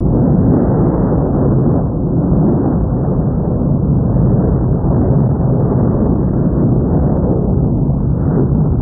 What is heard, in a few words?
volcano rumble; Earth and Beyond; EA Games